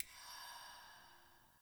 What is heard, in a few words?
UI Default Map Hover